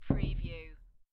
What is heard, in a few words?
Dice Throw One Die On Felt